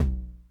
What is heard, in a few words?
Tom Low Accent Low Tone Max Decay, 909